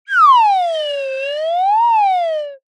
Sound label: cartoon slide whistle down